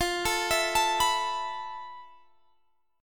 F Major 7th Flat 5th, Guitar, Drop D; x,20,19,21,22,19; down; chord shape

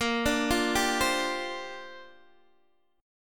A sharp 6th Add 9th, Guitar DADGAD

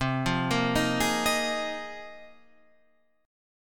Bb 7th Suspended 2nd Suspended 4th C

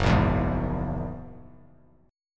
F sharp 9th F sharp Bass Standard; F#9 strummed

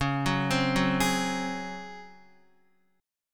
C sharp Major 7th Suspended 4th C sharp Guitar; C#M7sus4 arpeggiated; JGuitar

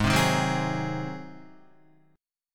Ab 7th Ab Guitar 4F,4A,5C,5G,5C,6E; Listen to Ab7 strummed; JGuitar

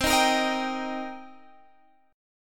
C Minor 7th C Guitar Standard; Cm7 strummed; JGuitar

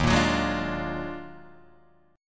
D sharp Minor Major 11th, Guitar, Drop D

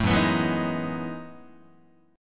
Gb Minor 13th Gb down Guitar; strummed; JGuitar

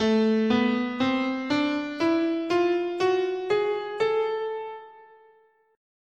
A Bebop Melodic Minor

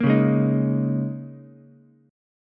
A sharp Minor 11th A sharp down Ukulele C6, chord; strummed; JGuitar

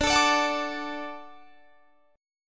D Major D down, D Chord; strummed

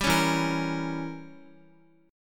E Diminished 7th E, Guitar; strummed

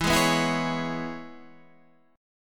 C sharp Minor 7th E, chord

